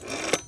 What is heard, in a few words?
Phone Dial; StecMetronome